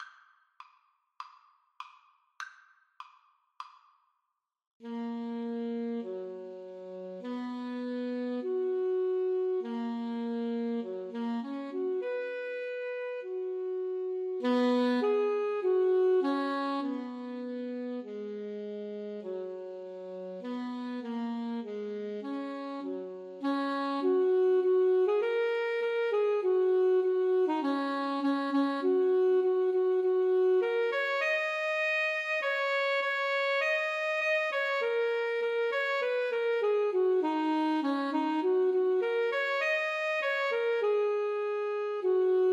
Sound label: loch lomond A SAX duet